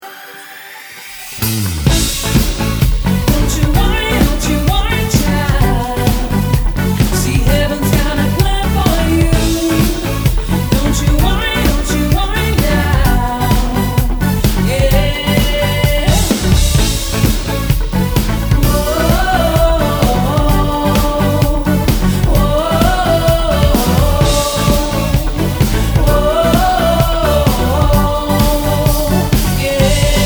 rock pop band; Staffs

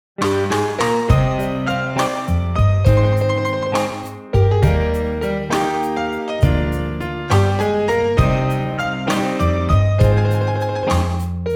Modern Vintage Swing Band

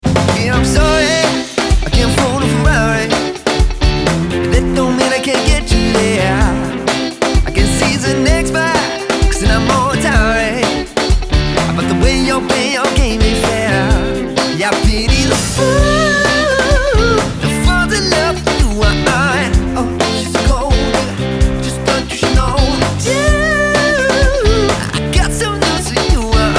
Rock Pop Band; West Sussex

